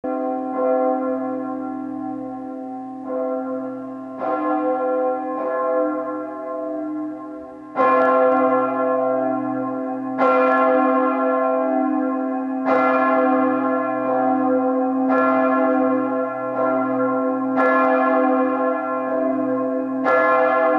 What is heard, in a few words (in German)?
Glocke; dom st martin rottenburg